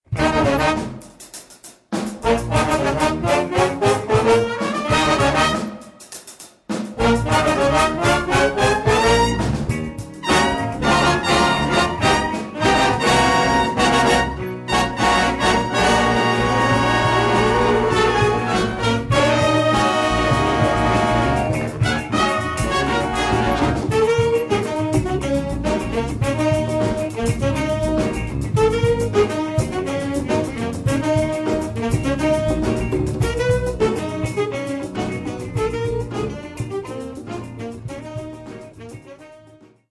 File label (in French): Funk